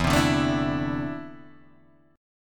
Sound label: E 7th Suspended 4th Sharp 5th, Guitar, Drop D